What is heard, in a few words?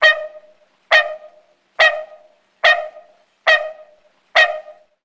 Frog Tree Honks